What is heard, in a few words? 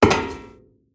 Metal Walk; moul